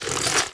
bad point

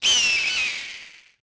eagle; AMBIENT; JUNGLE; Pirates Of The Caribbean

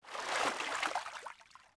water exit

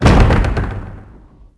trap door slam open; Max Payne; ragnarock

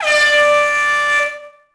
air hockey siren; Tony Hawk's Project 8; XBOX

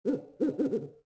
NIGHT LOOP; Cars; XBOX

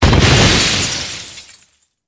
explo display Screen; impacts explos

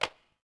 bad run, droids robots

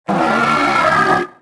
death; Star Wars Jedi Knight II Jedi Outcast; XBOX; gonk

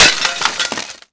break tiles

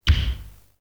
SHOES; UFC Tapout

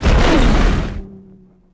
rico hangar Laser; impacts explos

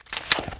Hand Gun Unequip

